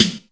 TR SD, HOUSE

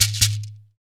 shaker, Afro Shakers, Shekeres; Roland LCDP14 Africa VOL-2

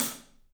PAISTE HAT, Drums; 3D Sound

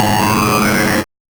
Noise, 8-bit, Bitty Noises

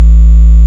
SICK A1, BASSES; Best Service Alpha Dance vol.2

